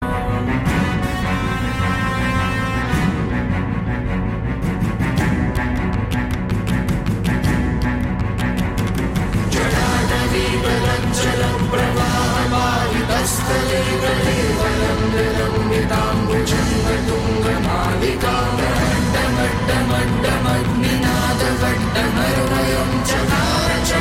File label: Chorus; Tandava